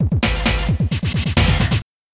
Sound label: Wheel; Super Monkey Ball 2; Monkey Baseball